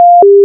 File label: error